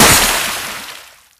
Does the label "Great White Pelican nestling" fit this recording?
No